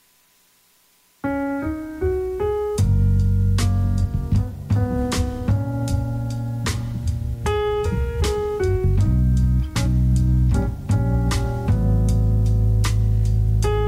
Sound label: jazz